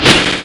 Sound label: electra bolt reaction; STALZONE; somber anomaly